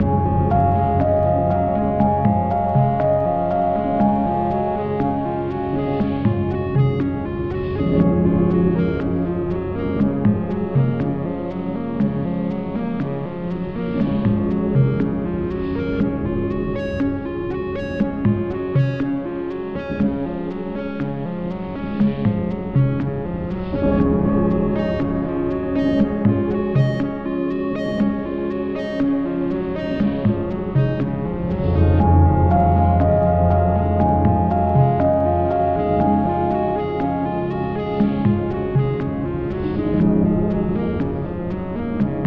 Zoom Piano House; minecraft story mode gamerip 2016; Order Up!

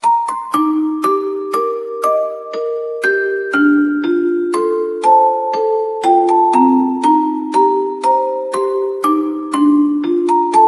Jingle Sad

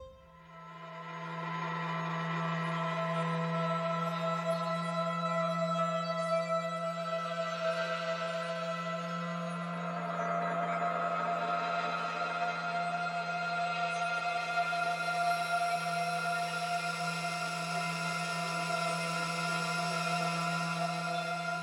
Music Neutral; Sifu; H4 Level Area=Post Intel; OfficeCalmDown

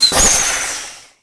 TURBO; SML2

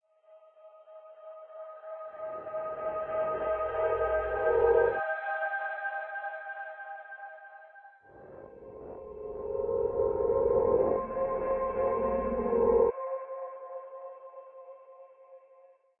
Success Tonal Menu

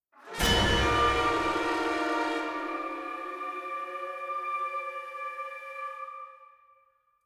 Stinger Shocking Short; Pillars of Eternity

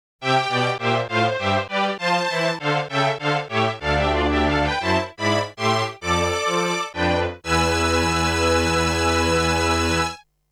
Quiz Success, String Quartet

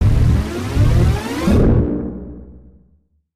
Power Up Thrust Pickup

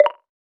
Game Notification; Do Not Feed the Monkeys

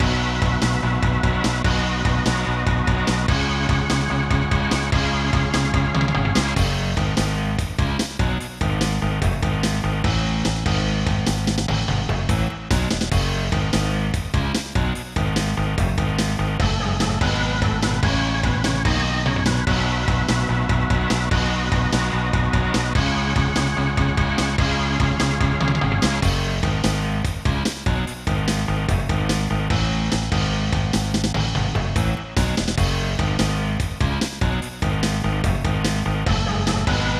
Congratulations!; biker mice from mars snes

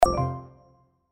pop Up

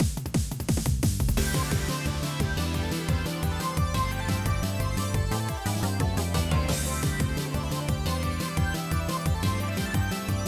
BGM Versus Fes Thunder

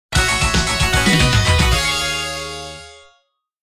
Extra Fanfare; soldam kaika sengen original soundtrack 2017; Sekiro Mode EX

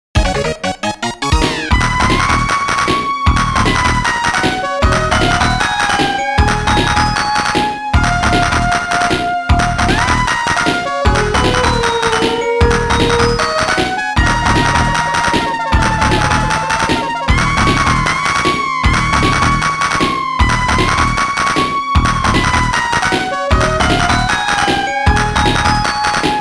Round Clear; Darius 30th Anniversary Box

